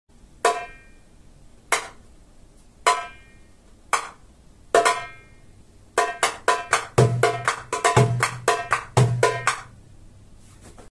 Kassoora, ساز

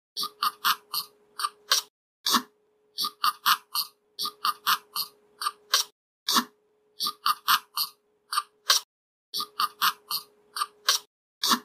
slow loris; چشم گرد تنبل

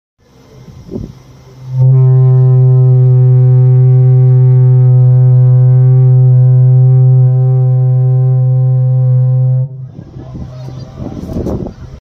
Lighthouse; فانوس دریایی

